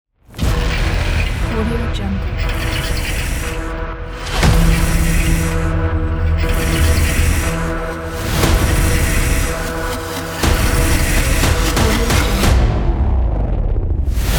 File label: trailer smash; synthezx